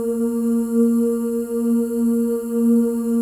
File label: FEM OOS, Symphony of Voices; Spectrasonics